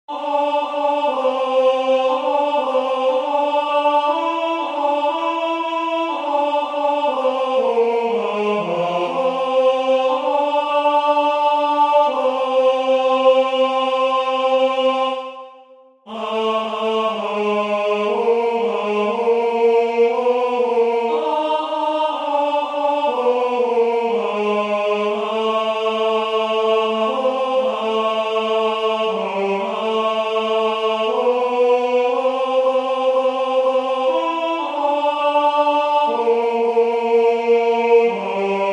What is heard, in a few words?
Tenor